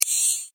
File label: multimedia rollover, Multimedia Rollover Tone; McKinney Sound